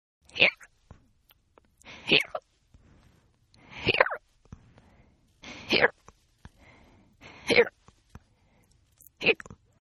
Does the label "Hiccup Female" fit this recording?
Yes